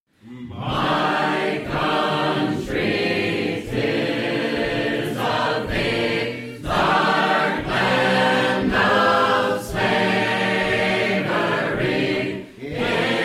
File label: Sacred Harp Singers From Western Massachusetts